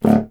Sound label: C Bassoon; Miroslav Vitous; Solo Instruments VOL-1